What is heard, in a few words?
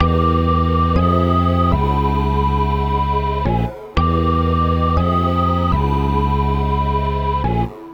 125, Keyboards